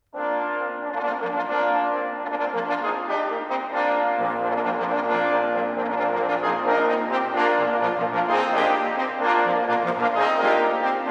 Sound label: fanfare sample